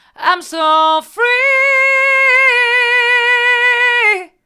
hard, vocal

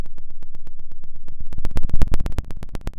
pop, Sound of a fire crackling

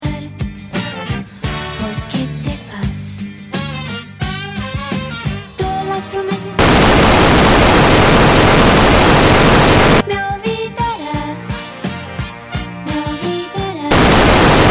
noises

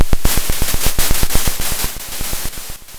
Sound of a drum roll

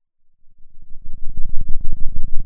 Sound of a thunderstorm, center

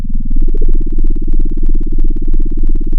pop, sound of a small motorcycle

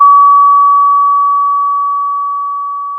Sound of a school bell

